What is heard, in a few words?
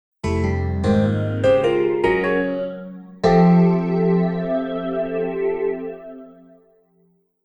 Quest Complete